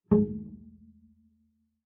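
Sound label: Bass pizzicato fortissimo sul A Ab2, Double Bass; MIS Pitches - 2014; Strings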